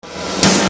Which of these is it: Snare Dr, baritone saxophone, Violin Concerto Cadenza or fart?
Snare Dr